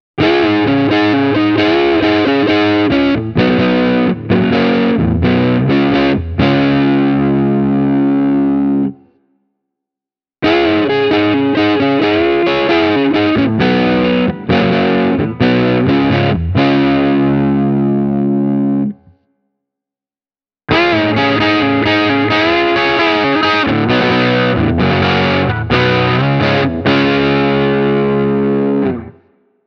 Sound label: crunchy, Rock-crunch, guitar; Each example starts with the three-way toggle set to the neck pickup